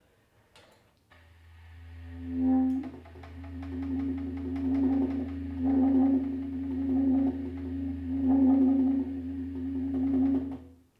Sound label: trilling